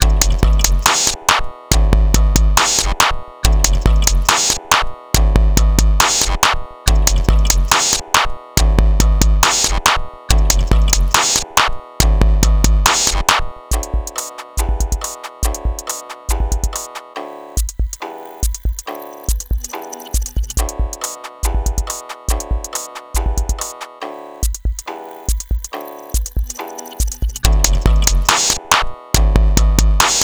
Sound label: hip Hop